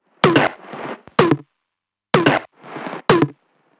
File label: toploop 126; rainman; Wave Alchemy Loop Tools and Grooves